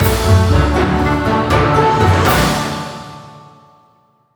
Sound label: Win Light; Citadels